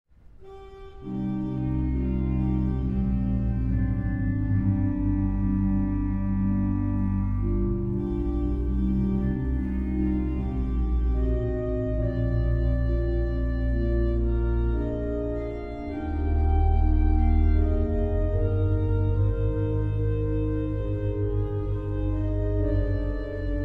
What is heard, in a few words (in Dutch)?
Aria (hoofdorgel en fluit); Gebed des Heeren